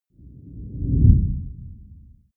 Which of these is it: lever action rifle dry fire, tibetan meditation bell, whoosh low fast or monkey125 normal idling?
whoosh low fast